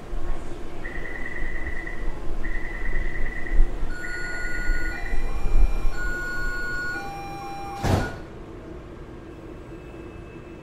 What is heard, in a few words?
chirp; butterflysoup2